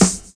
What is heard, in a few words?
SUB HH; Univers Sons - Kitch 70s